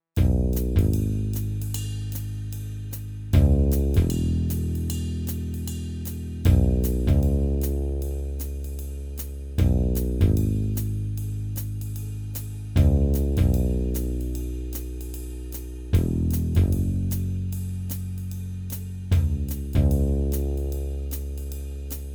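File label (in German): Jazz Kit Kick und Snare mit zufälligen Bass Sampels gelayert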